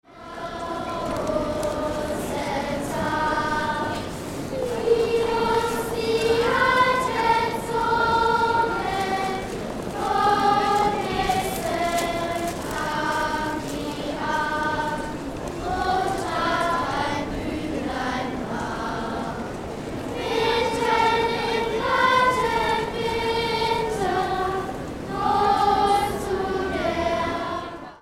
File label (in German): Chor Mic Sens High; Pocketrak CX